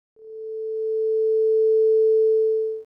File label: rise